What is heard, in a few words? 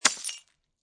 Glass Debris Smash, Impact, Glass Debris Smash, Crushing Broken Glass